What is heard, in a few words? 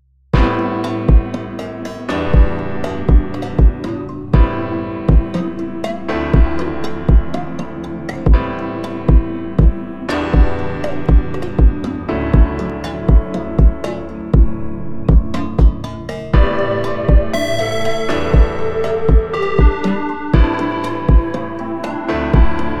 Morning Break Jazz mix, jazz